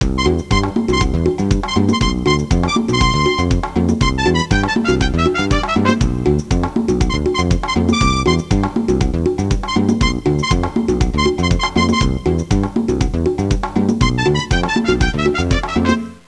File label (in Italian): musica traditional est; fareast